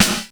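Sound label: GATE SNARE; Crypton - DrumBase5000; GATE KITS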